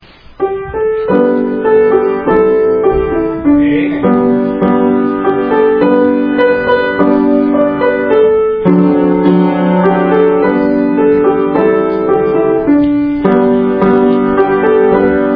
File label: Children Playing Piano